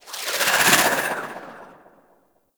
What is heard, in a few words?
Spear Projectile, Weapons; Warframe; Tenno; Priest Spear